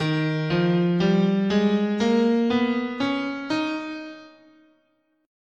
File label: D sharp Fifth Mode, guitar, scale